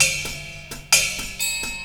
Acoustic Percussion

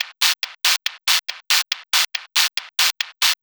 Hi-Hat Loop, Drums, Dance Beats

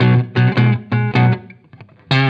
Electric Guitar, Loops; Guitars

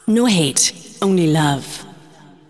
vocals; SoundFactory; SMPMANIA

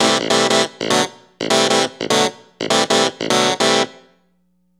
Electric Piano, Loops, Keys

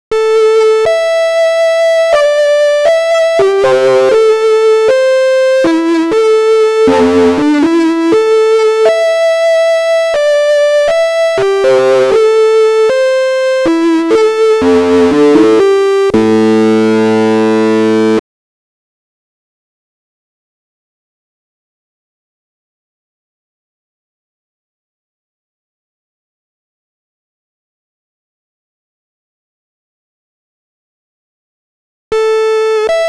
Distortion Lead, Dance; ACID; Custom Sound Sets and Songs; Wire

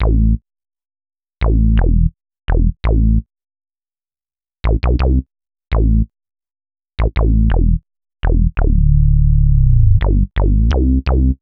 Synth Bass; Basses

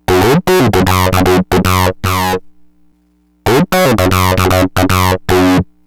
303 synth; pitchshifter PSI

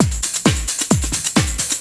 loop; SoundFactory; SMPMANIA

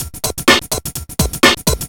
LOOP; SoundFactory; DRUMLOOP

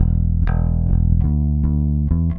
Electric Bass; Basses

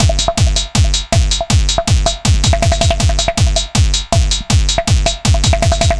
Dance Drumloop; Drums; Dance Beats

